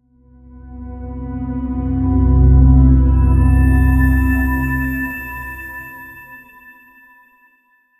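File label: dark mysterious and atmospheric, low strings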